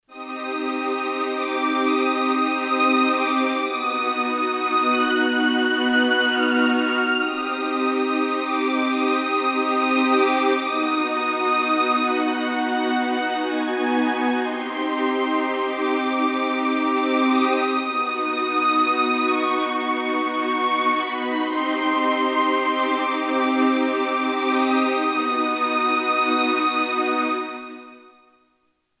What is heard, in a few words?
Dynamic Delay + TC Classic Hall; t.c. electronics M300; Engine 1 + 2